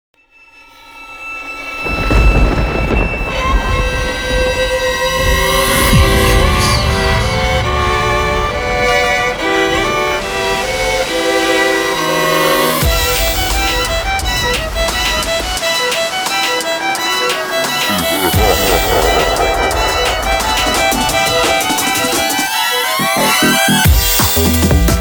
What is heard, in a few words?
Nightmare Strings